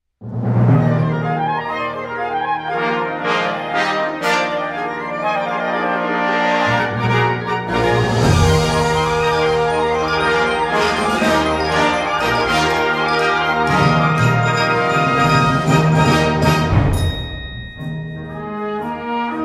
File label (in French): musique militaire grand ducale